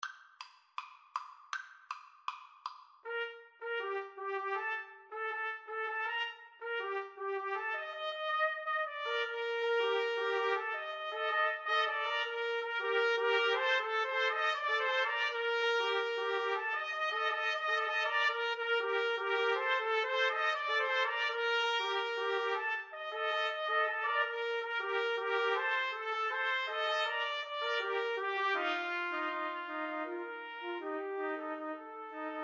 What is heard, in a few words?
la bamba, Trumpet Trio